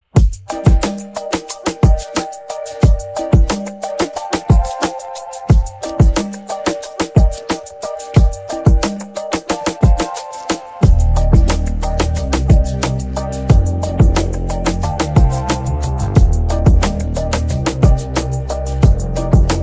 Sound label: Instrumental